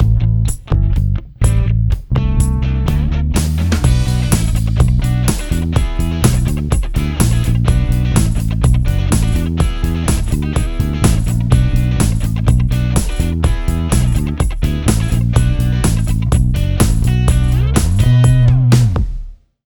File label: Robot Dance